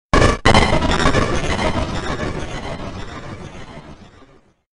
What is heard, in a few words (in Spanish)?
sonido de pantalla de inicio; fnaf 3